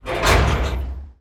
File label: iron door close